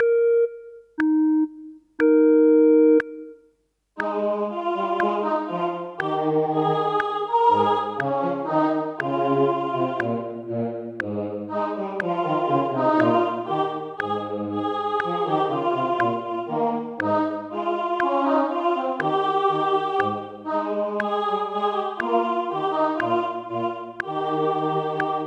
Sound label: O clap your hands; Gibbons